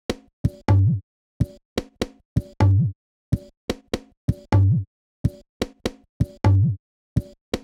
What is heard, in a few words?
Perc Loop 125; Equipped Music; Loungin House; Perc Loops 125